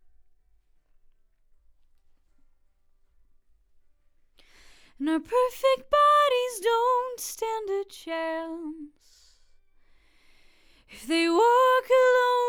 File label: Vox